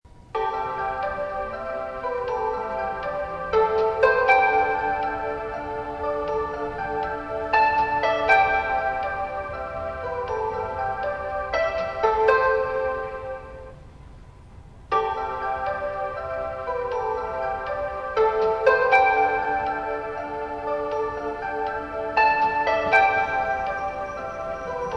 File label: melody